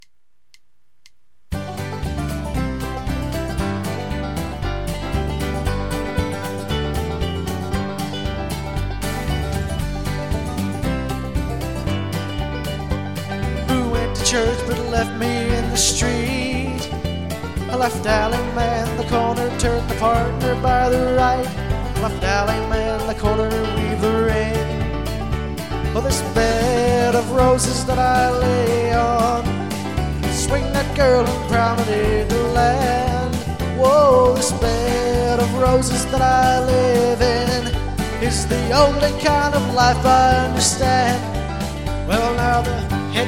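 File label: Instrumental With background vocals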